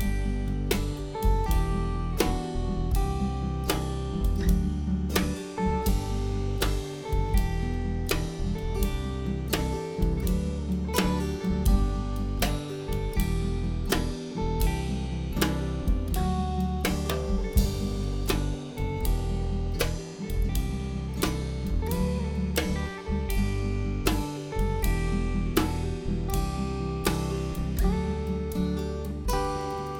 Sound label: no vocal; MusiConGen